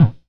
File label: Bonk Unch, Kicks; Airwindows